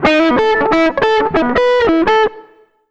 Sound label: SOLO; Univers Sons; Jazzistic